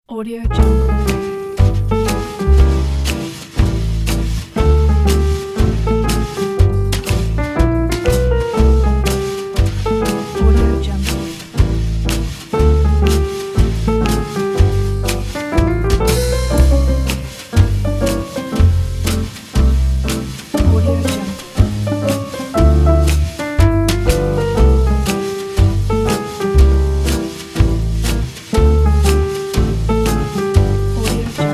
swing jazz piano retro comedy

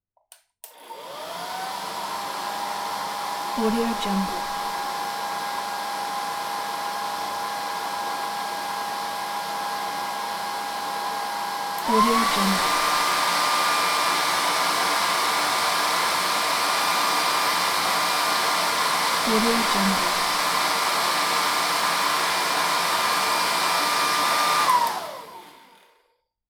hair dryer

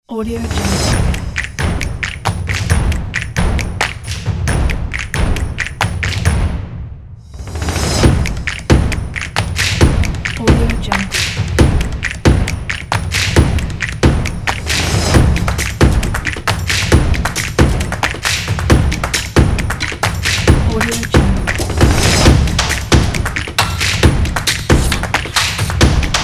percussion

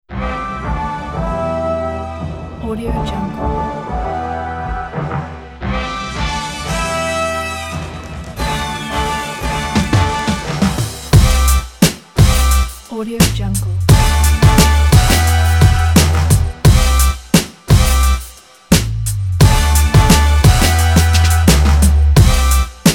street jazz beat

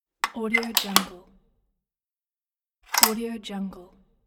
plug power in and out

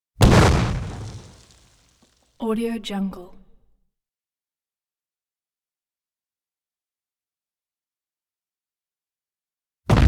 explosion big bang some debris reverberant

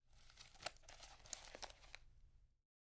notepad paper movement

